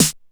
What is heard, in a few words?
Snare Syn, Korg 05R-W